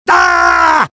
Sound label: pain severe; demoman; svencoop addon; tfc; player